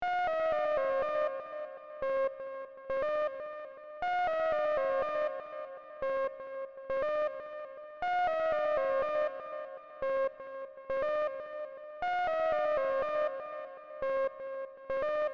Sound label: sine wave